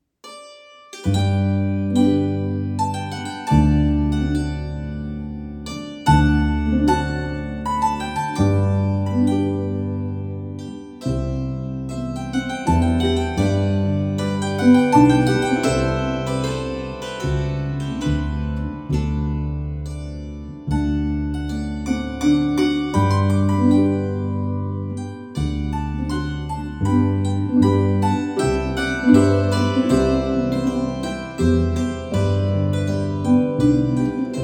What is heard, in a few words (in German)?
Menuett